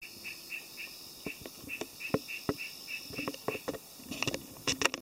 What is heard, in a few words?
amagaeru